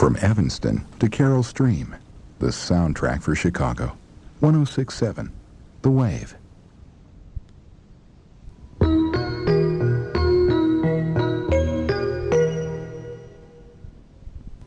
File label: station id jingle; WaveMemories; wave basic